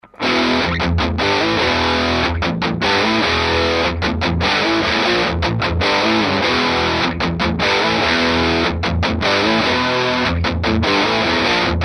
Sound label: Riff TIGHT normal hot us modern; WowaRiff; Tweaker